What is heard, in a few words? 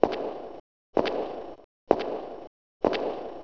foot